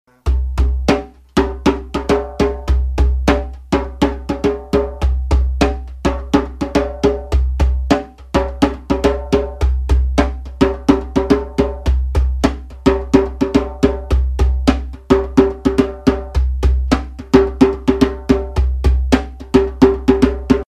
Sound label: Djembe